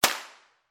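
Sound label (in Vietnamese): Tiếng bắn súng nhựa đồ chơi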